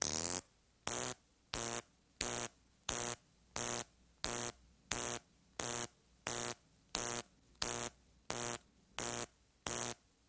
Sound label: Neon Light Buzz